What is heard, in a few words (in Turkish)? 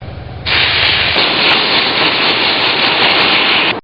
torp atisi